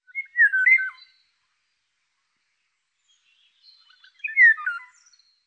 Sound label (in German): Pirol Oriolus oriolus